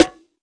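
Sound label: Snare, classic hip hop; STYLE KITS; Classic Hip Hop Mega Kit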